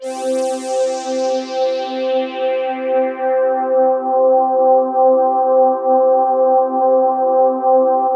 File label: RICH HARM; Greytsounds - Scott Peers Sound Engineering